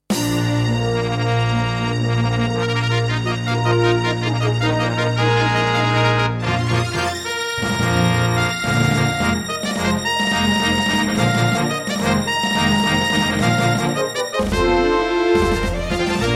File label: Intro, Yamaha DB51XG GS MODE; Dark Forces